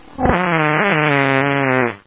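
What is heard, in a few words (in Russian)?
пуканье долгое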